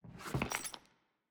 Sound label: Footstep Plates Rotate